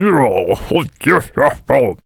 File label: Vampire Attack Vocals